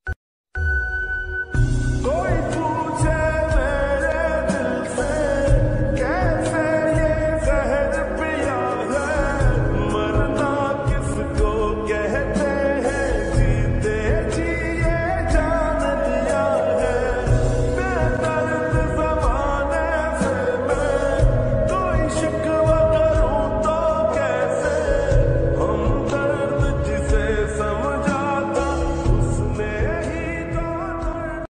mood off ringtone bewafa ringtone sad ringtone romantic ringtone